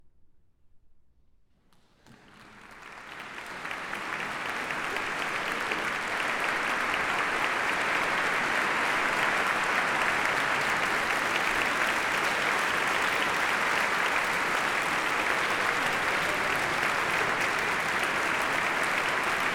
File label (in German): Applaus